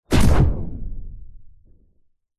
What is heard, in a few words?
science fiction weapon incoming bullet hit with whoosh; zapsplat